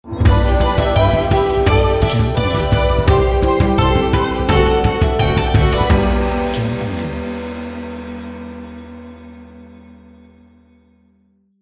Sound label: China Logo